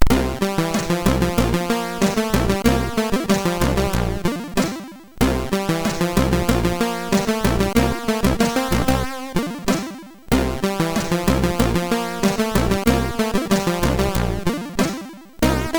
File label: sid MOS6581R2